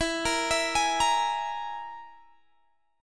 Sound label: E Major Flat 5th E, Guitar, Open G; chord shape